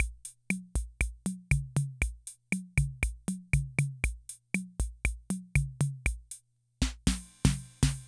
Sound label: Loop, rhythm 55, classic machines